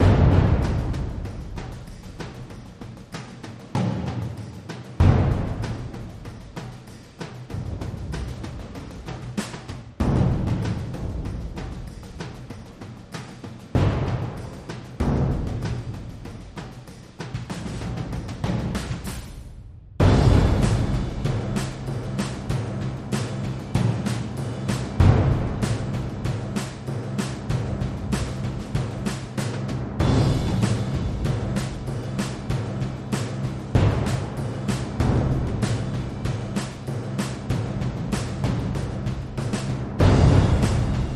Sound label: War Drums